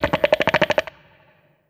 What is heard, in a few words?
chat; gonarch